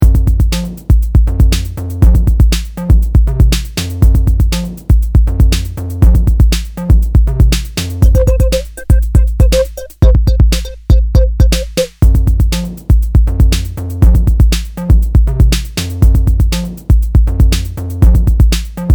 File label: shaker